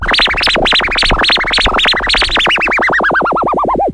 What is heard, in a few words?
worn down scratching; Zaps and Beeps